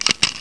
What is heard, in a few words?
cam snap; Isaac Asimov's The Ultimate Robot (1994)(Microsoft Home)